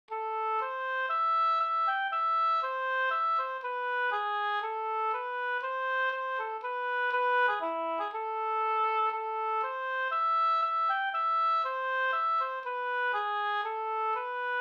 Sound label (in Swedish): polska slängdans; Rattvik Da